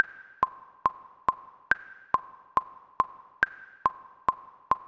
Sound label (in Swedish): Popmusik metronom